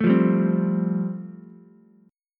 G 7th Suspended 2nd Suspended 4th G chord, Ukulele; G7sus2sus4 strummed